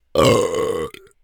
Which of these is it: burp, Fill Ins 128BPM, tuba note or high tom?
burp